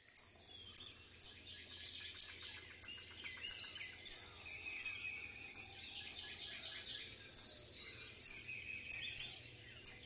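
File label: tink calls alarm, Northern Cardinal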